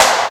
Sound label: clap one shot f key, Royality free hand clap tuned to the F note. Loudest frequency: 2565Hz; percussion; single hits one shots